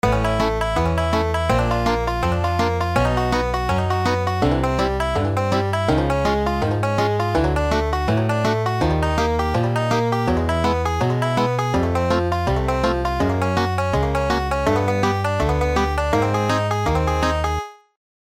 tab banjo improvisa

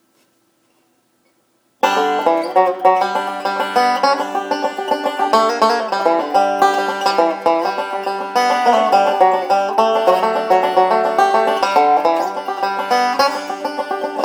banjo

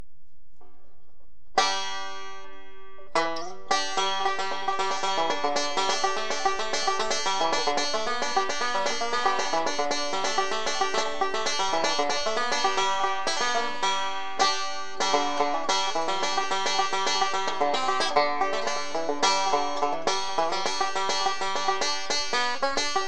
maple neck, banjo

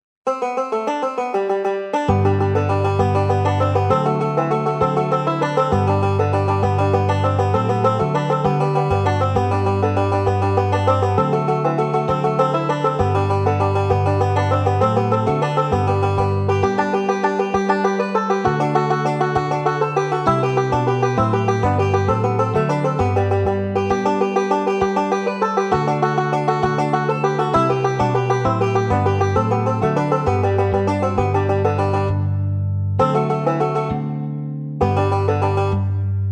irish wash, banjo

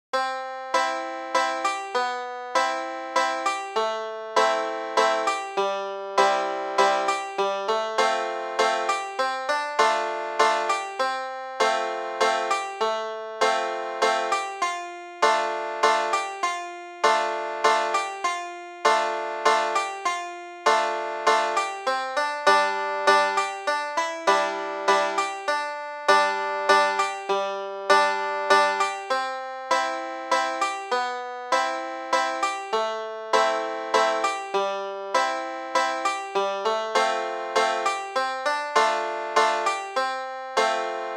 banjo

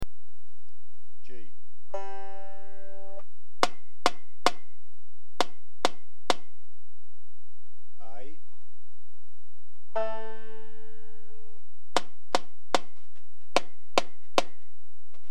head sound, banjo

